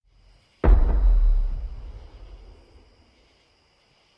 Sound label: Cannon; Hunger Games